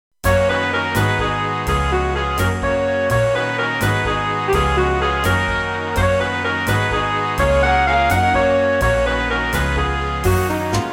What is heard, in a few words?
x-mas is coming big band